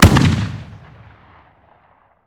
shotgun fire atmo ext; gmod; viper

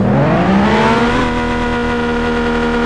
1st gear, vehicles; enzo

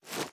reload empty arm mag, weapons; wfoly ar; viper

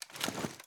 kilo98 drop cloth; viper

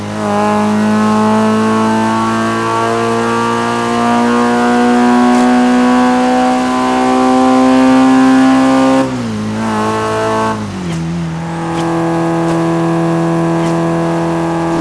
fourth cruise, vehicles; gmod; tdmcars

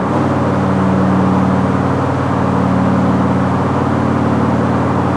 fourth cruise, vehicles; schafter

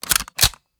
sm uzulu reload empty scope charge; reloads; iw8; gmod

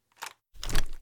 sn raise first end, weapons; viper

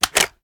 ar reload drum fast mag out, weapons; viper

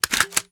reload empty drum drum out; gmod

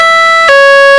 uk hilo, siren; gmod; svmod

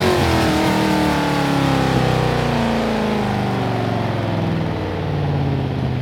slowdown, mer c63 amg; vehicles

